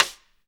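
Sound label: snare drum rim; Best Service Gigapack 2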